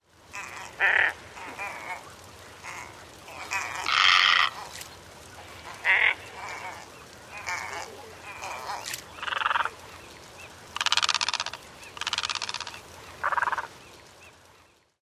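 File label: reddish egret croaks & other calls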